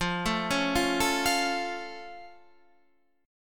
F Augmented, Guitar, Standard; arpeggiated; 13,12,11,10,10,13; down

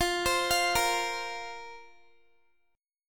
F Suspended 4th F, Guitar; Fsus4; arpeggiated; x,20,22,22,22,x

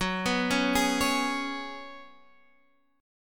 Gb Suspended 2nd Suspended 4th, Guitar Standard; Gbsus2sus4 arpeggiated; x,9,9,6,9,9

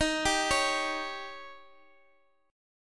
D sharp 7th D sharp, Guitar, Open F; D#7; arpeggiated

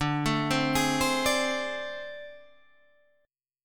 A Minor Major 7th Double Flat 5th D, chord

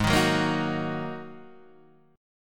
G sharp 7th Suspended 2nd G sharp Guitar Open D

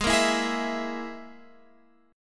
G sharp Suspended 2nd Sharp 5th, chord; x,x,x,13,10,11,10,13

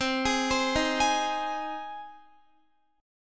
C Minor Sharp 5th, Guitar; arpeggiated; Cm#5; x,15,18,17,15,15; 4E,4A,5D,5G,5C,6F

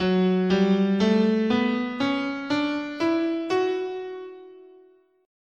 F sharp Phrygian, scale

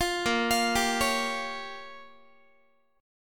A sharp Minor 6th A sharp down Guitar, chord; A#m6; arpeggiated; 20,18,20,17,17,x

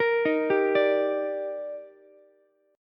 D sharp Major 7th, Ukulele; arpeggiated; JGuitar

